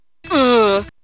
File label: moan